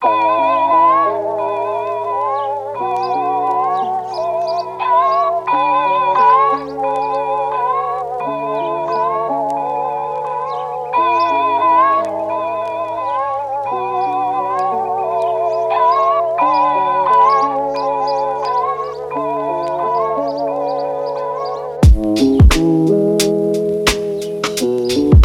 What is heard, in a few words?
Chill Electronic